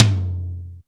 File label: TOM DRY; Back In Time Records; Big Bang